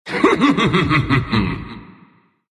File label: crown laugh ru